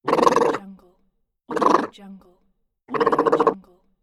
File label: the funny lips